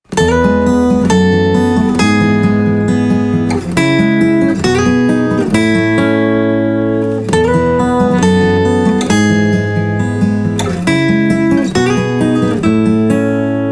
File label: BGM Camp 135 Short